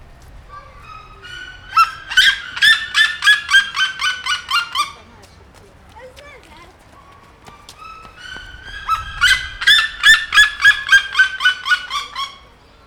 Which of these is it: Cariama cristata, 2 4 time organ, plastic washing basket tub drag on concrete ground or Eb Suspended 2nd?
Cariama cristata